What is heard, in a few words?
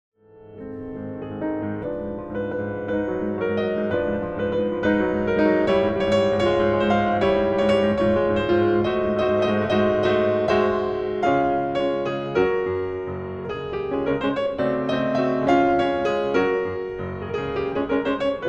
Piano Solo; Mixing Secrets For The Small Studio